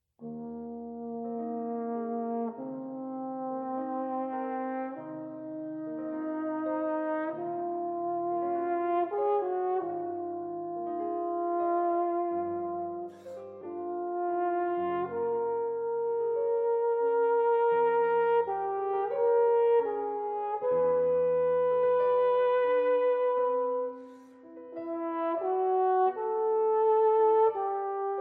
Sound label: Sonata for Oboe and Piano